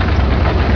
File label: crawl; assault